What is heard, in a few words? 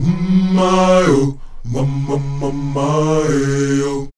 UM MAY, Afro Chants